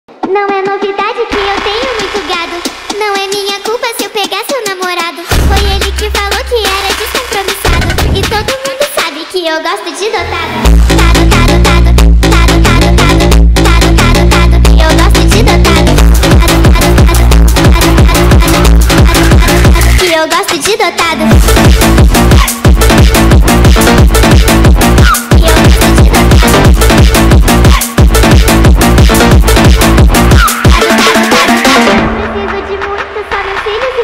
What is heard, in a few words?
Phonk Sped Up